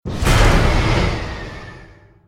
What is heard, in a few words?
Stinger Action Short